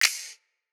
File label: Snap